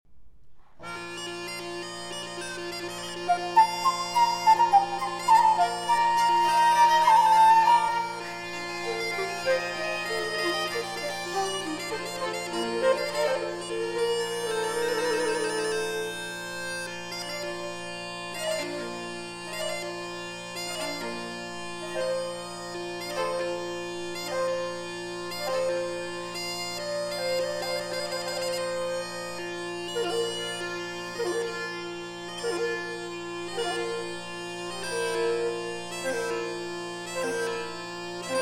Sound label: Divertissement Champêtre in Trio For Musette, Flute and Violin IV. Légèrement